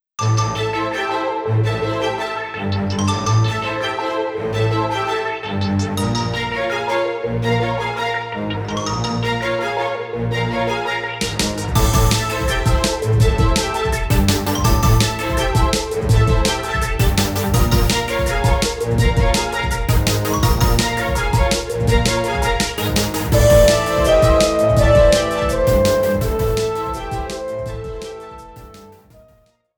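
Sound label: background music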